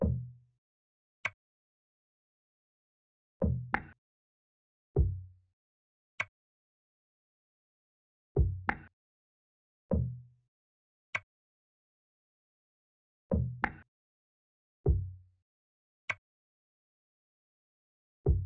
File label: 97 BPM E Min Perc Loop; Melodies; Loop Stems; Cymatics; 2022 Melody Mystery Expansion; Sleepless Night